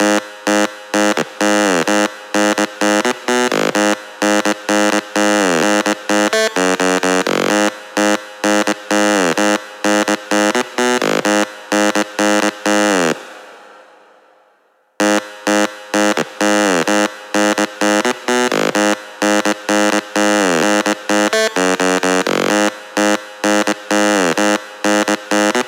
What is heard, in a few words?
128BPM Bass Wet noSC, Root G#min; Demo Constr Kits; Break the Chains; Bassline